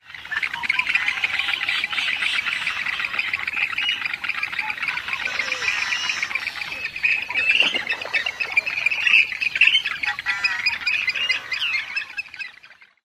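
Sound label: mechanical sounds, Western Grebe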